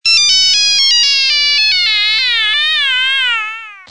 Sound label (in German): sms tone nokia sms down kaputt nokia